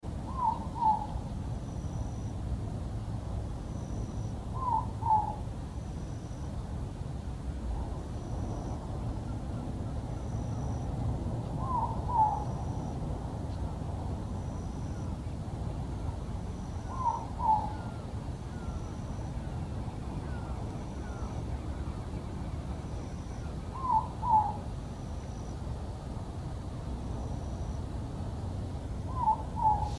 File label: ruru